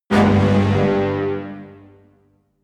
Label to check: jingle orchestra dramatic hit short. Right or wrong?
Right